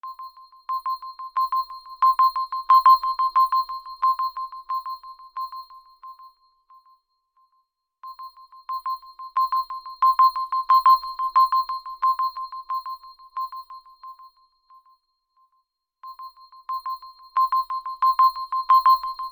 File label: Pulse Signal, alarms; Infinix; HOT 40i